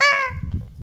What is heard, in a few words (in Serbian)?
crvić umire